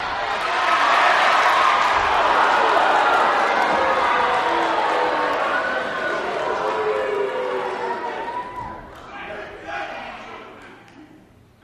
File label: Crowd Cheers Then Boo